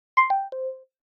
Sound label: Low Battery